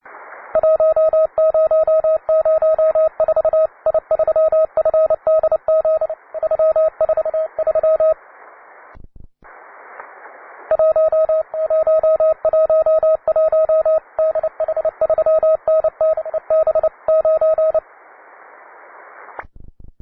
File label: cw; Training CW QTCs (Advanced); JA3YBK